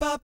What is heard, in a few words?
"bap", Jazz Fx, D4; Spectrasonics; Vocal Planet Vol.3 Jazz Fx